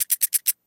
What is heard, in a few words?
Spider Sound; MiSide